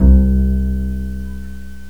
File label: harp; gmplay; strings & orchestra